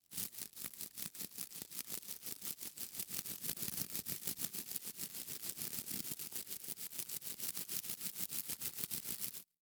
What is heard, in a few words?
Human Teeth Brushing Teeth Short Strokes